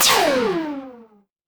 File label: retro game laser